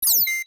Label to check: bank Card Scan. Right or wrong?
Right